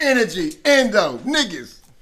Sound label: Rap Vox